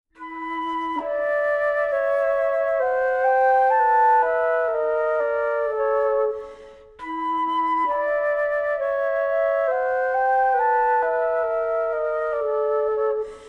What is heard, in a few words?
Flutes Sample